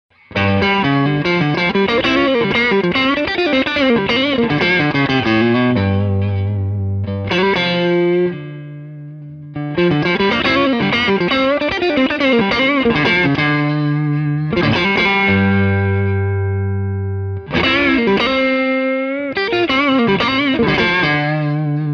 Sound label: LTD riffing